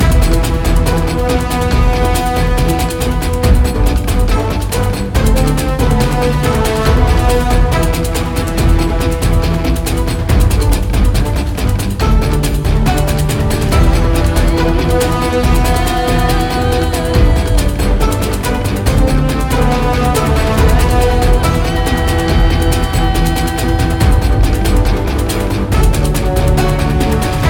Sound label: Action 140bpm High